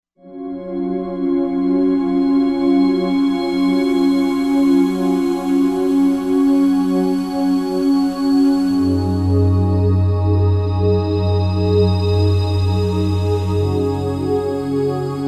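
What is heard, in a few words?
Pad Glass choir; 13 Glaschoir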